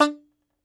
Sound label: Tenor Banjo; Plucked Strings; E-MU Producer Series Vol. 7 – Old World Instruments